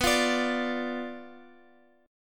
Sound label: B Diminished B down Guitar Open F; Bdim strummed